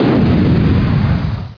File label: TORPEDO